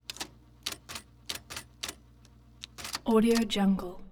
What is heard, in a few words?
electric typewriter on two fingered typing; prosoundfx